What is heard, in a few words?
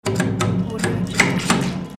valve water switch